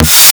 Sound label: Star Instruments Synare PS-1